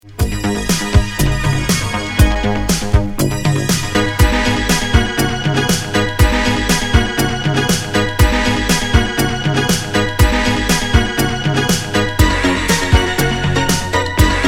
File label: Instrumental